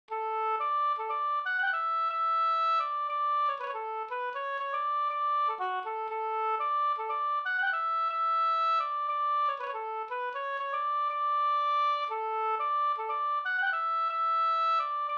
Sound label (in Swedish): hamburska; Brudpolska fr Flen Sd